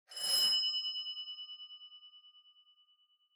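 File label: Doorbell Mechanical Bell Single Far; ドアベル 機械式 ベル; シングル 遠い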